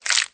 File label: number pop off